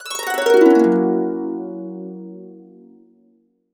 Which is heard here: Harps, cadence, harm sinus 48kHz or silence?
Harps